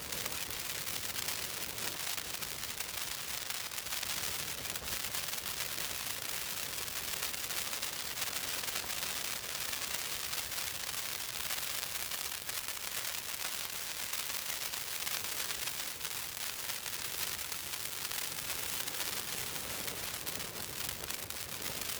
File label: gunpowder burn